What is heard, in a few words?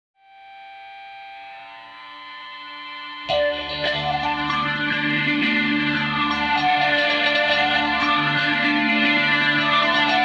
Electric Guitar Hard Rock